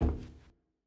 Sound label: Footstep Metal; Silco